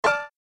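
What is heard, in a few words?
button bonus click; Marvelous Circus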